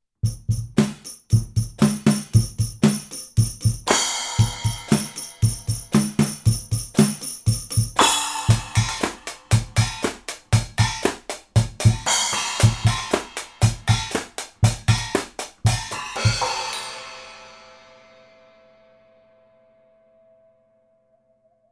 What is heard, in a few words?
Drums Voodoo Blumlein